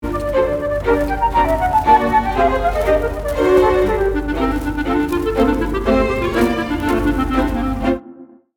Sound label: Overture 3 Layers, Layer 1: Melody in the flute / • Layer 2: Staccato chords in the strings / • Layer 3: A countermelody from half of Vln II.; Rule of Three; The Nutcracker